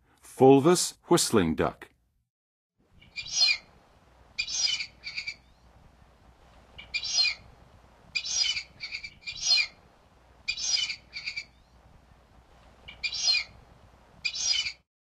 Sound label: Fulvous Whistling-Duck; N. America; Songs of Birds of North America; Western USA Songbirds